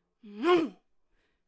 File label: Swordcaster Attack Vocals